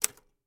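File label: Typewriter Single Key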